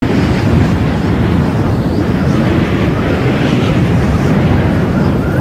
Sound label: wind sound effect for flying